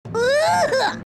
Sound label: exertion